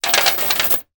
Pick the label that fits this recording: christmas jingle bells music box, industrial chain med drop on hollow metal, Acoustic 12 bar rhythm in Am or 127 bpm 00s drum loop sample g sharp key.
industrial chain med drop on hollow metal